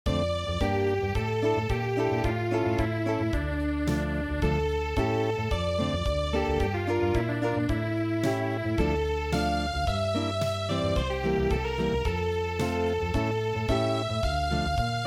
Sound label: Unplugged